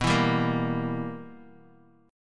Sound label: B Major 7th Suspended 4th Sharp 5th, chord